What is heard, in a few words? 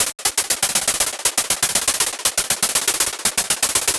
Gated Noise 120BPM; creative gate; One Shots; musicradar